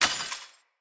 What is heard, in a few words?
Casting Device Drop, Weapons Tech; Enemy